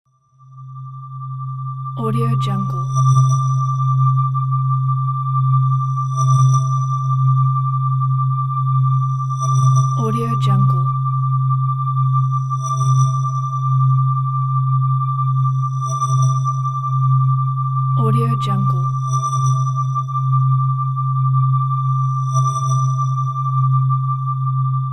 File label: ambience pulsing drone